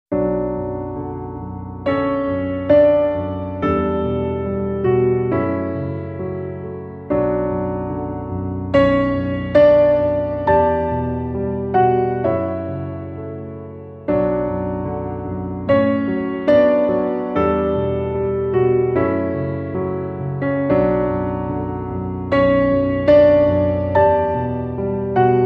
Piano Only

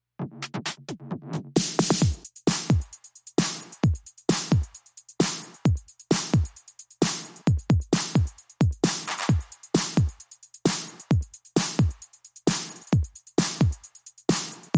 Bonus Beats

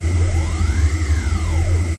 Soft Noise And Hum Transition

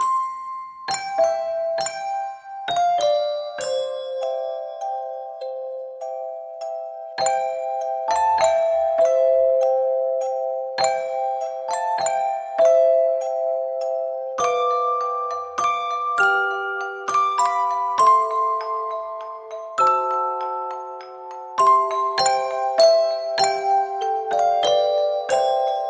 Silent Night Music Box

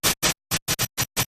Short Sputter

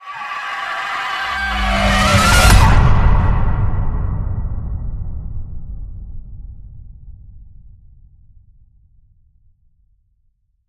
Vampire Night Scream Hit

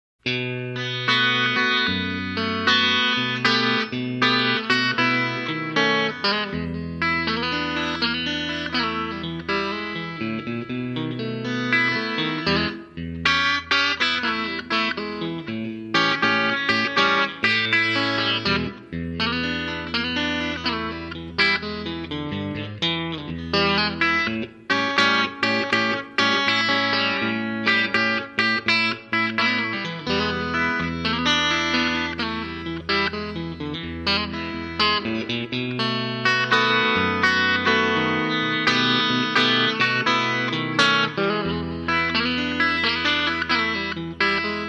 Electric Guitar Slow Blues Full

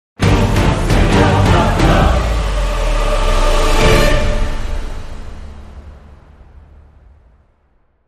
Dramatic Sting

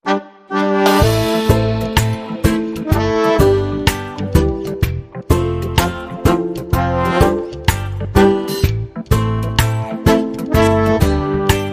Reggae Am Gipfel

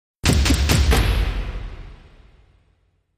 Big Drum Sting

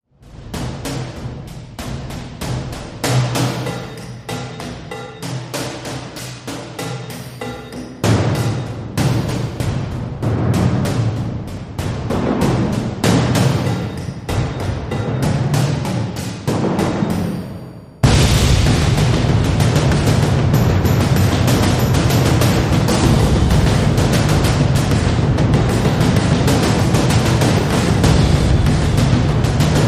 Restless War Drums

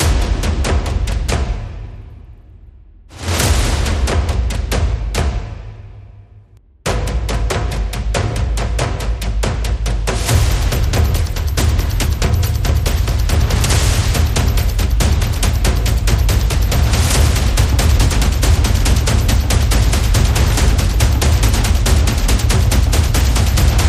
Mammoth Hunters, Percussion

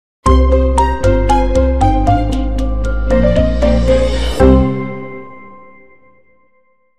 Short Sting

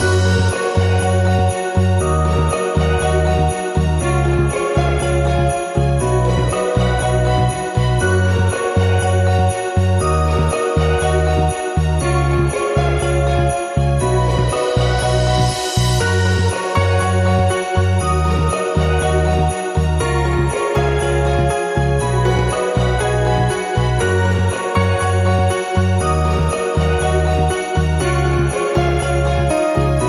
Loop No Drums

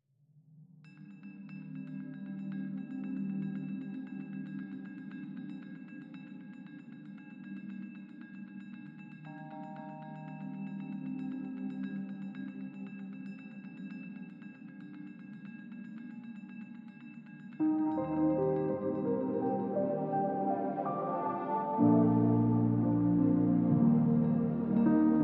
Seachange Piano DX7

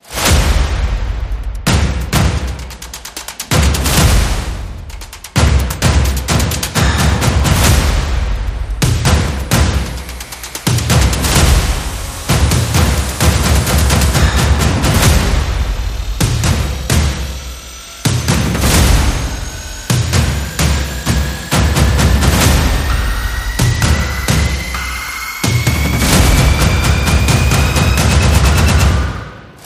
Percussion and Effects

